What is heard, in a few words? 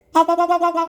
Wubs